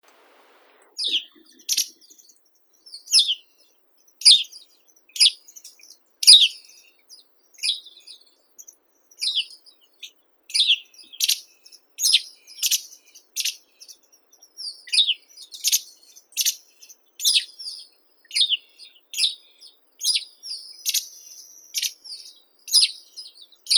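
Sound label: Kamchatka wagtail Motacilla lugens, nature sounds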